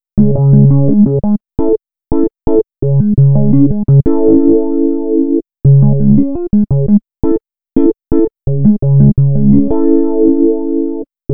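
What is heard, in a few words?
Wawa EP, electric piano, electro lounge; Sonic Foundry (Sony Creative Software)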